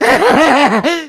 jockey lurk; voice; idle; Left 4 Dead 2

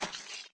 flesh, footsteps walk; Left 4 Dead 2; boomer

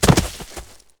body fall; Mount&Blade Warband

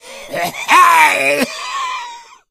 smoker alert, voice; Left 4 Dead 2

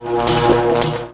construction sparks; Emperor - Battle for Dune

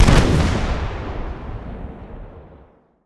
ships destroyer attack; Hearts of Iron IV Death or Dishonor

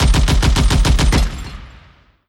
light airplane fire; Hearts of Iron IV Death or Dishonor

